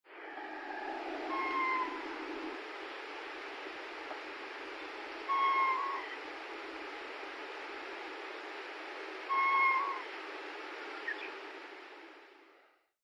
okonoha; nikko

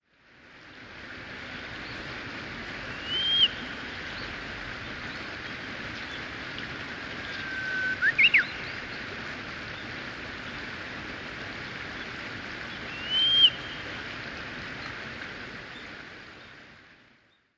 uguisu, utsunomiya

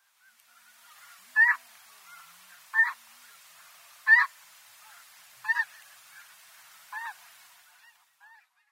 hakugan, hachirogata, koe

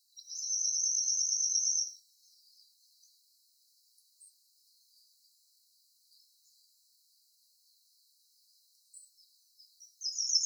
amurumusikui; hyogo; koe

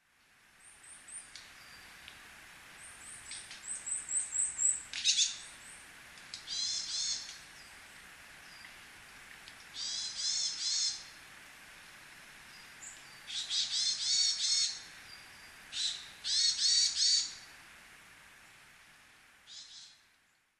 yamagara; chichibu

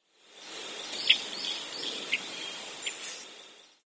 zugurochakincho, sakata

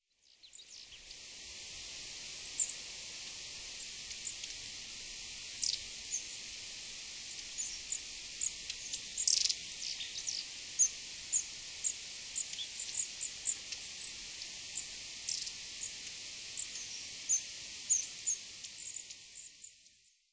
shimaenaga, tomamae

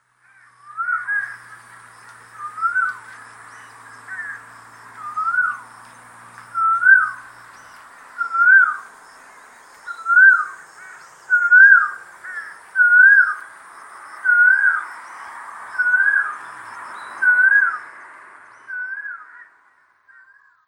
onikakkou, ishigaki; オニカッコウ; さえずり; 沖縄県石垣島; 簗川堅治

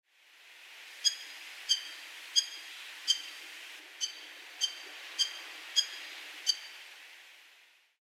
chogenbo, toyota, koe

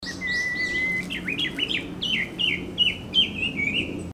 gabicho uguisu; mane

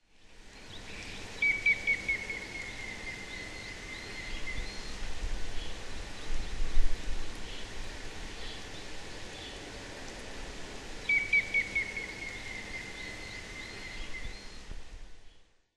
yamagera, muroran

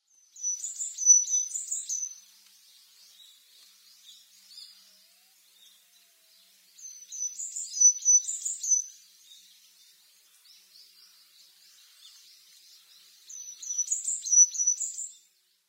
amamiyamagara, amami, koe; yanagawa

